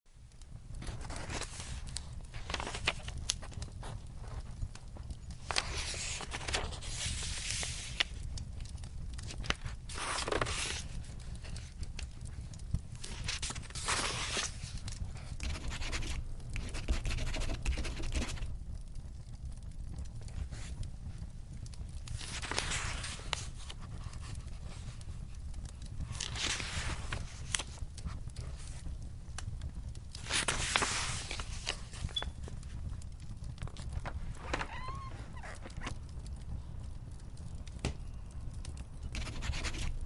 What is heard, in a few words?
Book soundscape; hugo